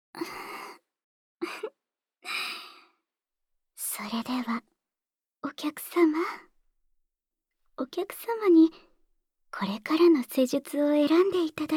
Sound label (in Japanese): 【ボイスのみ】 耳舐め手コキ; メンズエステ; 高音質バイノーラルKU100